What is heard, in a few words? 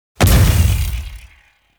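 Blast Projectile Explosion; Warframe; Iron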